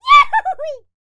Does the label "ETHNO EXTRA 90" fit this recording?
No